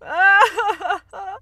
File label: sadness